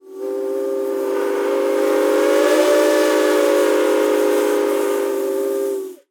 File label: Steam Whistle; Blastwave FX